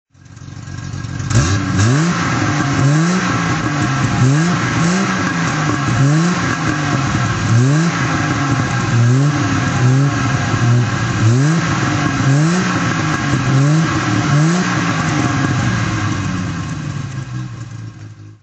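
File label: triumph tr6pi orig exhaust throttle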